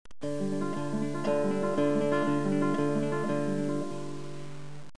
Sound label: loop; Music Toys; kbot